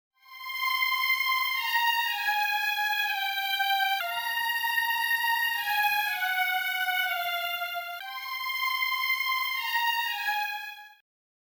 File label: violins